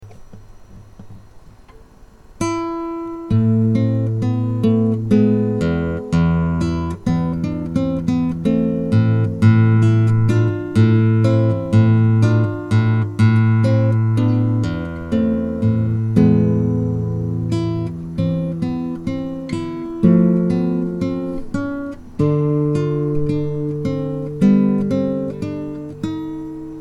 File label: a minor andante, classical guitar